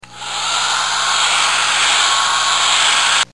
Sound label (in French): Aspirateur